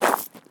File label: Knight Right Footstep On Gravel With Chainmail